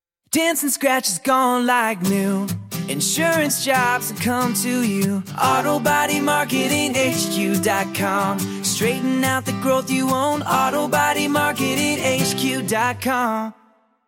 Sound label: jingle